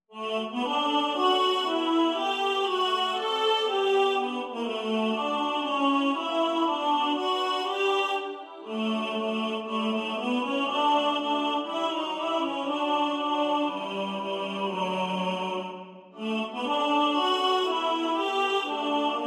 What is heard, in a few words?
TENOR